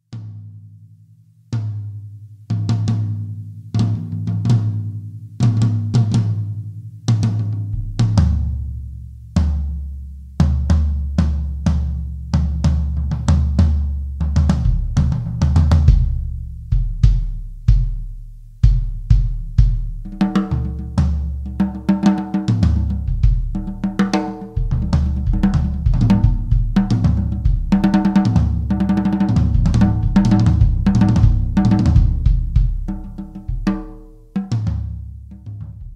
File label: big band shells, drums